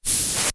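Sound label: Air swish long left to right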